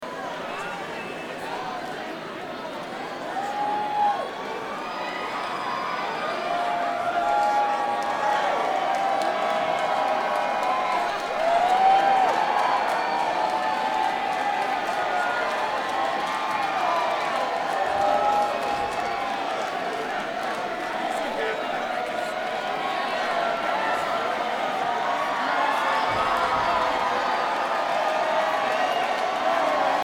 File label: Crowd